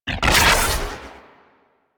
Arch Gun Primary Beam Shot; Warframe; Archwing; Hildryn

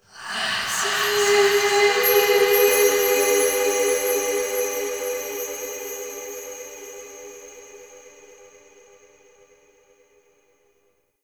Spirit Tree Holy Chatters, Ambience; Glades Of Andurin

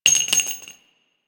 Shell, Weapons; Dual Vipers

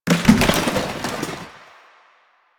janitor cart impact

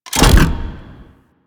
Extending Bridge Stop; Warframe; Ambience; Corpus Gas City; Gameplay Remaster

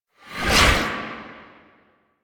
Impiety Riser; Warframe; Warframes; Dagath

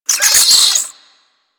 Infested Minion Pain; Warframe

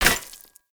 Polearm Medium Impact Rock; Weapon Impacts; Player; Environment; Sphere Polearm

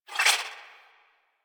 Swing, Melee; Balance Four; Warframe; Weapons; Modular Melee

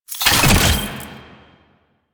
Key Deposit; Warframe; Nineteeen Ninety Nine; Exterminate

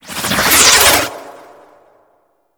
Ability Cast; Warframes; Loki

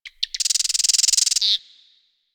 Forest Bird Squak; Ambience; Warframe; Grineer Forest

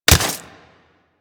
Staff Grab Idle, Weapons; Warframe

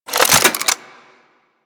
Rifle Reload; Warframe; Weapons; Bolto

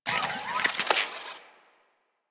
Drone Switch Out; Warframe; Deimos; Cephalon Drone

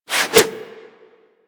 Zipline Brake Foley; Warframe

